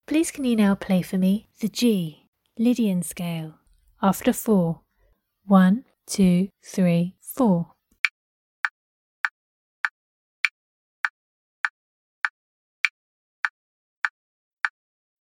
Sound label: g lydian scale